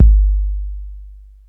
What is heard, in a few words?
kick; E-MU Formula 4000 Series Vol. 1; Hip Hop Nation; Bonus Perk